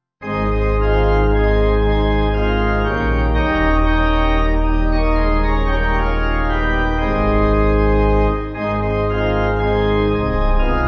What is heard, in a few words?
What A Wonderful Message Pipe